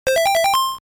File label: Pause Jingle; Teenage Mutant Ninja Turtles Fall of the Foot Clan